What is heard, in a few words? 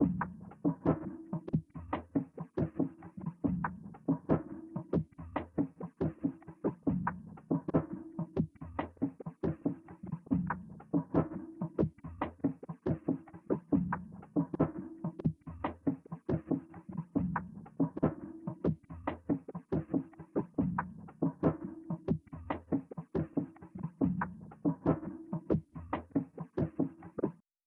140 BPM B Min Percussion, Trap; Melodies; Loop Stems; Cymatics; GHOST (Beta Pack); Arcadia